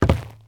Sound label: zombie footstep wood; DeadFright